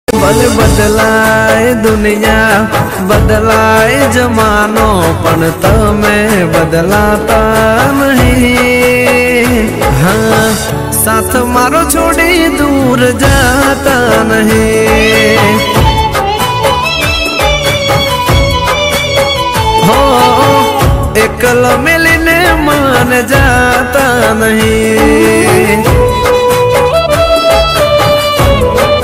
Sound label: gujarati ringtone